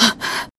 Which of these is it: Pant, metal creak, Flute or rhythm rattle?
Pant